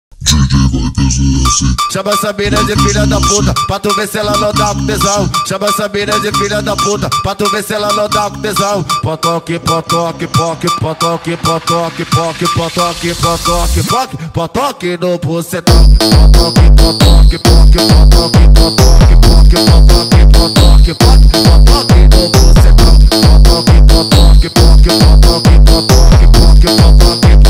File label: Phonk